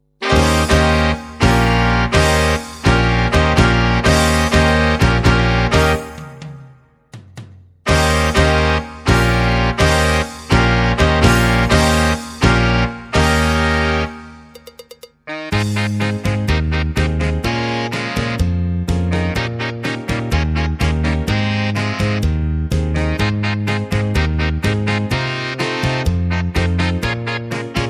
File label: Cha Cha